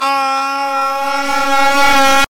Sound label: screaming; meme